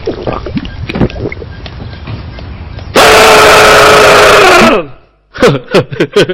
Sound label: Brrrrrr; Coke